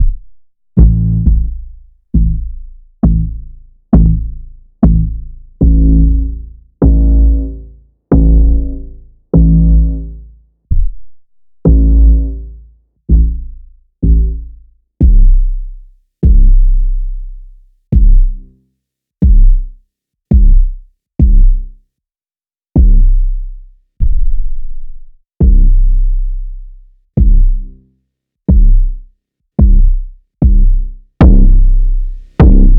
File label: Kick Processed